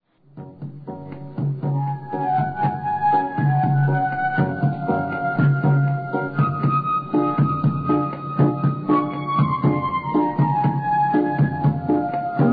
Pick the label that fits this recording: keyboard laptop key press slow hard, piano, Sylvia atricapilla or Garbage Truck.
piano